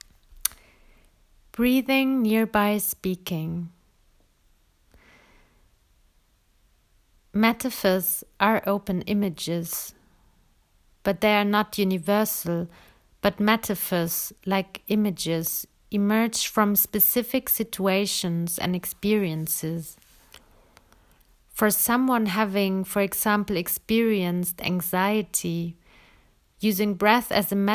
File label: breathing nearby speaking